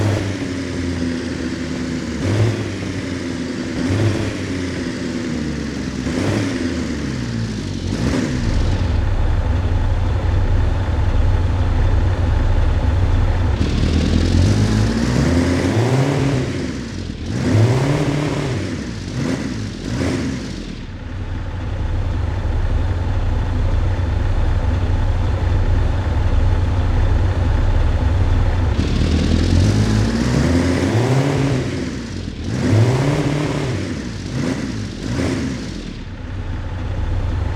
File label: throttle off, vehicles; bus